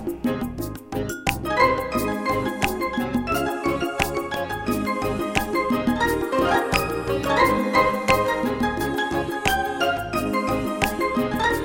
Lazy Reggae

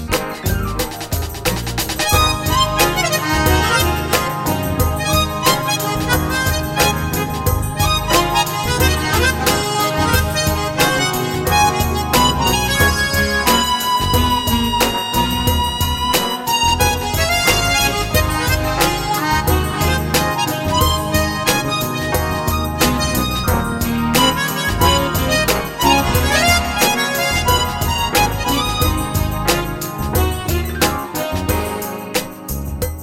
Blues

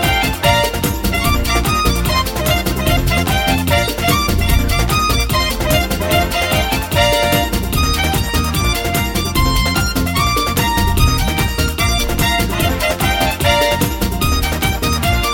Merengue

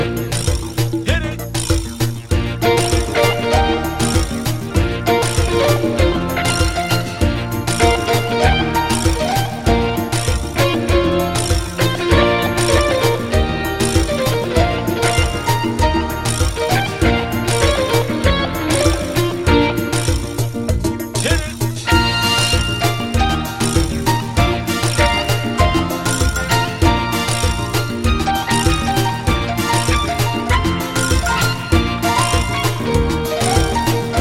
Reggaeton

